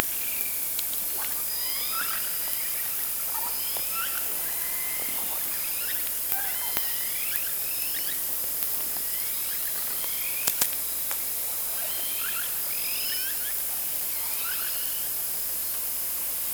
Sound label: Orca sound Night Passby